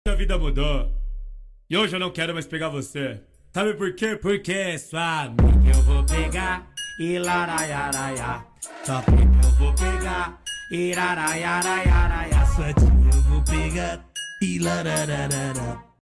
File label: Tiki tiki Yara Yara phonk